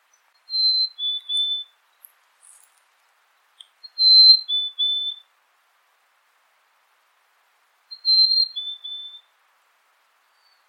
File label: mystery bird call